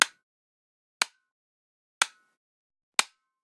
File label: Generic Click Plastic Small; boom library; The Interface